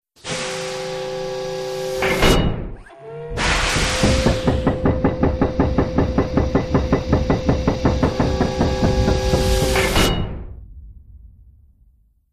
Vault Massive Opens; safes

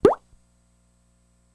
Boink Bing Simple

